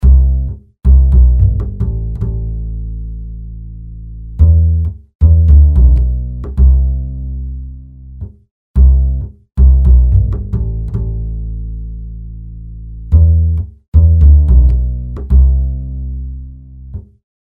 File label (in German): Bass trocken; Pearlcoder